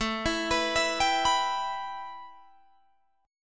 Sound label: A 7th Suspended 2nd A Guitar Open D, chord